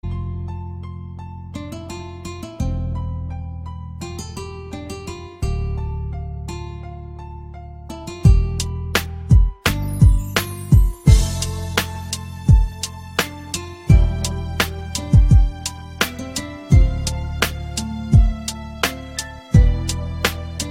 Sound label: relaxing music